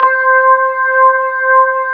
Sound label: B3, Keyboards Old School; USB Soundscan vol.09; AKAI